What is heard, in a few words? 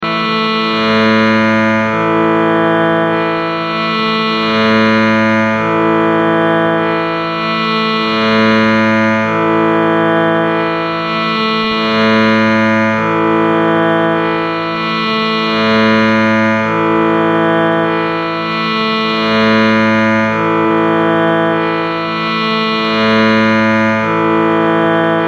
Sruthi A